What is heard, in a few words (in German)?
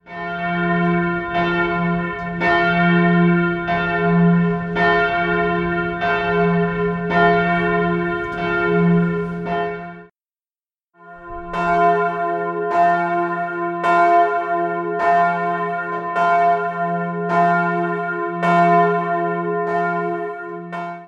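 Münster in Freiburg f Glocke vorher nachher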